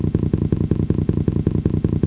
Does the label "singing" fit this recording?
No